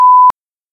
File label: beep once; Camera; android 5.1.0